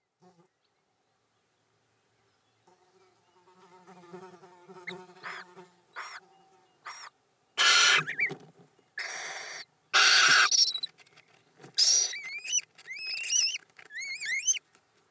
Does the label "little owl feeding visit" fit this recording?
Yes